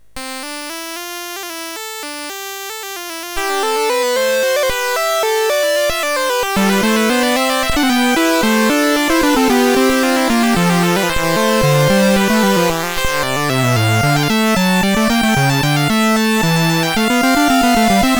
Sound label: Fugue in C Major, MOS6581R3